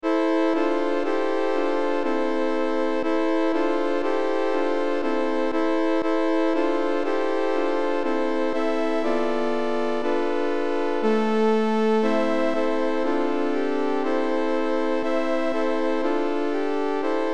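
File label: Trisagion, mixed; Trisvyatoe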